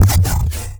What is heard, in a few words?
Multimedia Internet CD-Rom Flash Beep Low Pitch